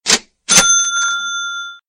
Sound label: donate sound